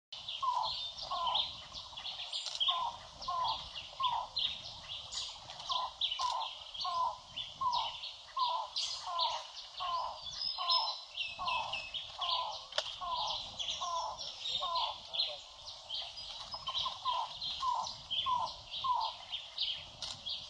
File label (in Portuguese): canto do tocororo